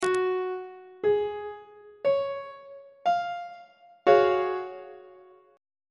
f sharp major 7th suspended 2nd chord on treble clef